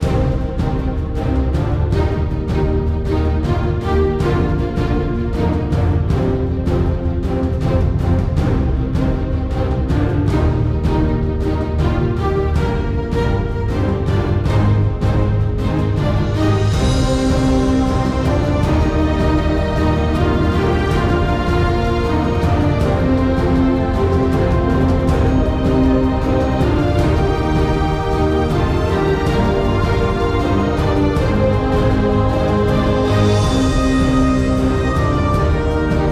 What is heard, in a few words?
epic; Knight Squad